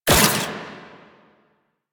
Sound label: Scrap Pickup; Warframe; Missions; Zariman; Zariman Assassinate